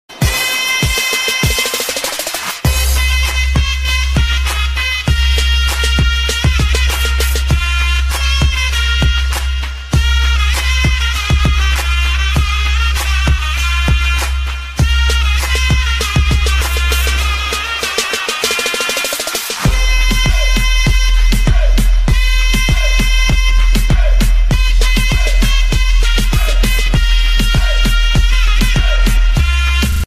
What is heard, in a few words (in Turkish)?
Telefon Zil Sesleri Mehter Marsi Trap Zil Sesi